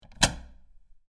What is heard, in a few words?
Locked Metal Door Handle; Door Closing; Doors; Crafted Signals